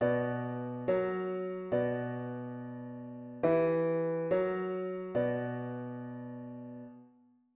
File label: diat root w bass